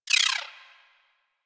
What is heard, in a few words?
Dex Sybaris Tech B; Warframe; Weapons; Tenno